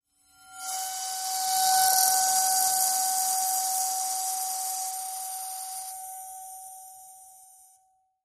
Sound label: BBs Vibrate In Bowed Bowl As It Is Bowed; bowed-metal